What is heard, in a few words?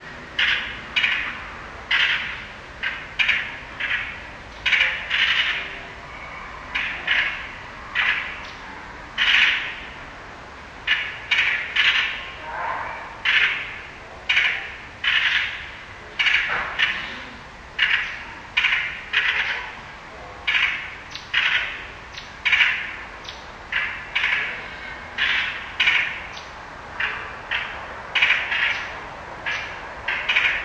bird chirps; a conversation between two birds outside my window, slowed down to half speed (1 hour duration)